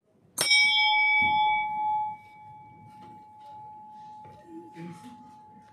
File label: bell